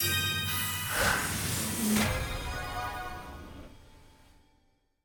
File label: freespin Countup Counter END; codex of fortune